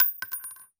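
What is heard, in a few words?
bullet case bounce 308 cement close large; casings; gmod; viper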